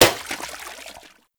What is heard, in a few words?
Water Balloon Impact